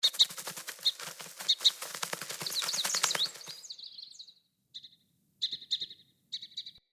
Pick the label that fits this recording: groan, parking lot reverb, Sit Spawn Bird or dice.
Sit Spawn Bird